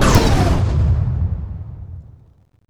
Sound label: you hit; Cosmic Rage; The Experience-light; ships